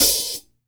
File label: Open Hats